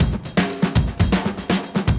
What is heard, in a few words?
breaks, 120bpm; free bespoke breaks; BeatStyleG